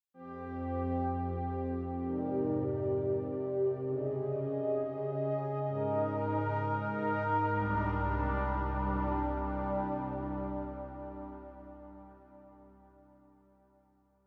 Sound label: Synth Haze